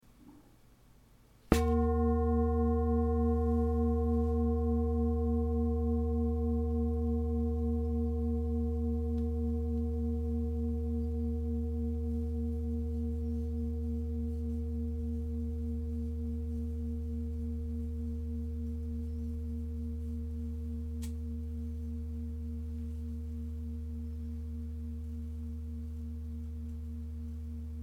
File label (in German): Klangschale